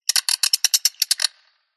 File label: squeaky metal Designed Alien Bird; PMSFX